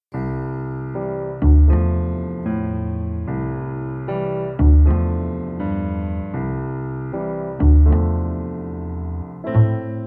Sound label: smokey jazz bar